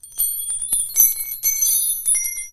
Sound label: metal debris falling